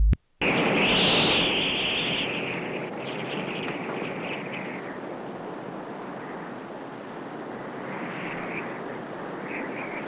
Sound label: Burst Of Whistling Wind